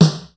SNR, Classic drum machines; SR16; musicradar-synth-samples

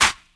Claps

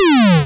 Synth Shot; Vengeance Samples

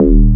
Offbeat Bass D#; Vengeance Samples

